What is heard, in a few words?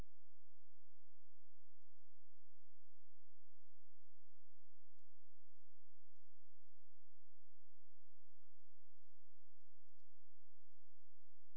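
hydrophone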